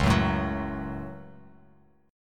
Db Suspended 4th Sharp 5th, Bass Standard; 9,9,7,11; strummed

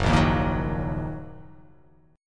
Gb 6th Gb down; Gb6 strummed; JGuitar

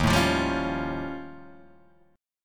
D sharp 9th Flat 5th, Guitar, Drop D

